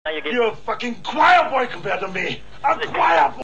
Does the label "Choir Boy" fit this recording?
Yes